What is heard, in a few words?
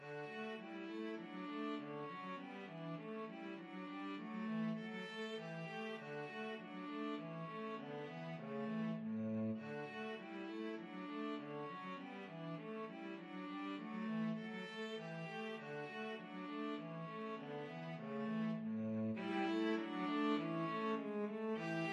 melody viola cello duet; schumann